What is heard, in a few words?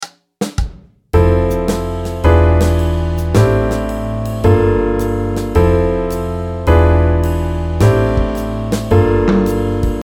harmonize bad